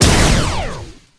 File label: synth laser; Earth and Beyond; EA Games